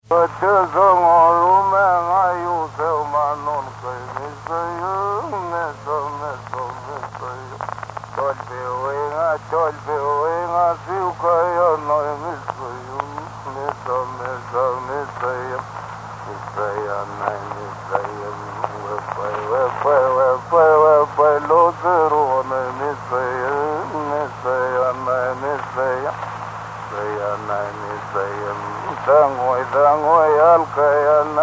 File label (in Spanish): Canto de hombre; Música Mapuche